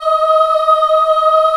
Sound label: D#4 CHILD OH, Choir; USB Soundscan vol.28; Acoustic & Synth; AKAI